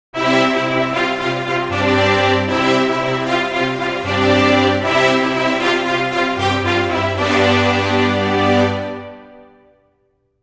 fanfare

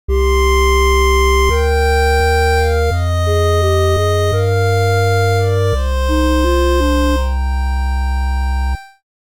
8bit game over

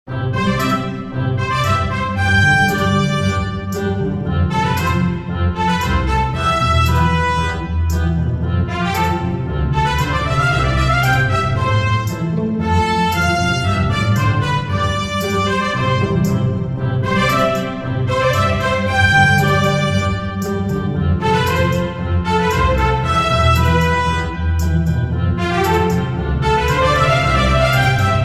march; iikoto

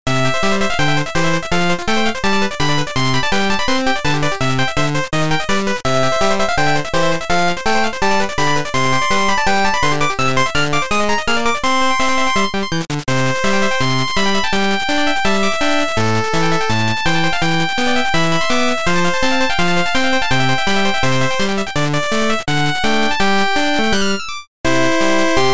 8bit hoshi carnival

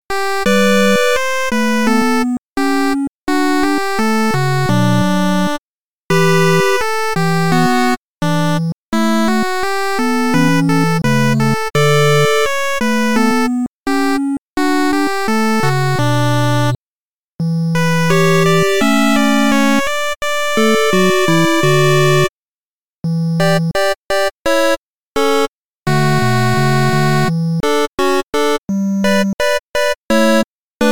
8bit; kizoku shiro

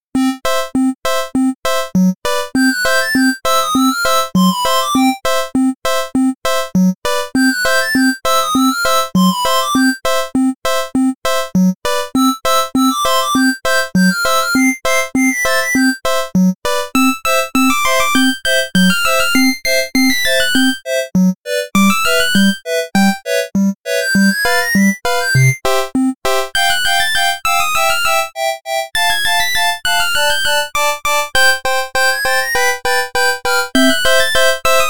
8bit mini dessert; xylophone

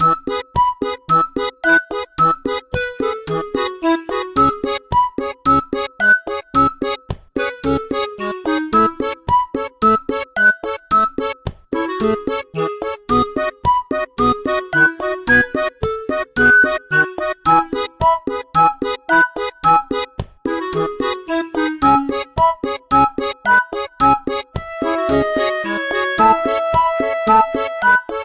Loop; ushi picnic

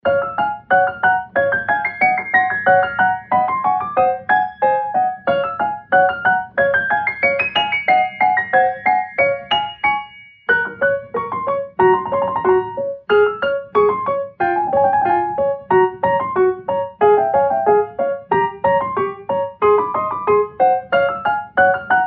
piano; usagi ice

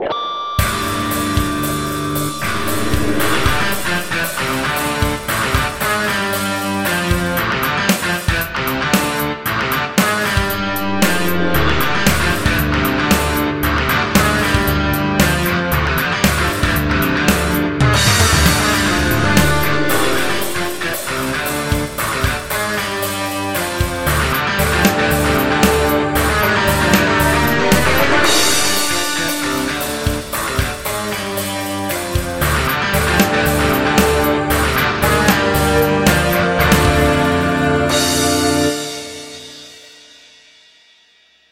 rock guitar

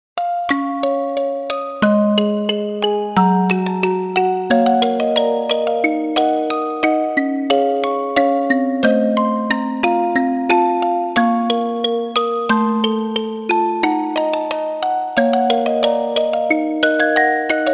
musicbox

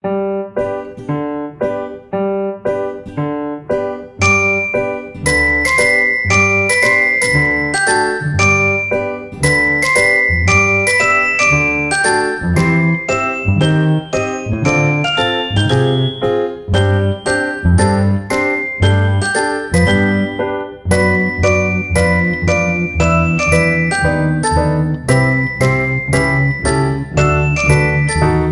Loop; walking cake

